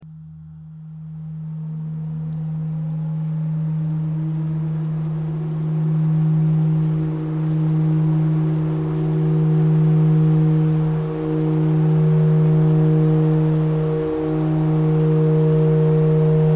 tornado siren clarinet notes e thread simple

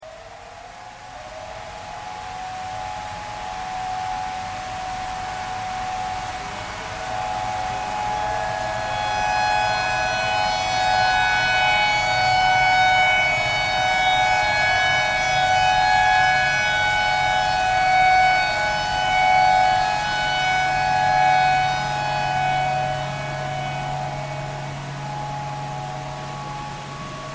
tornado siren

